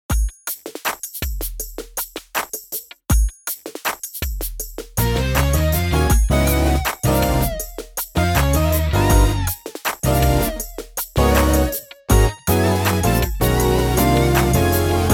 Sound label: drumless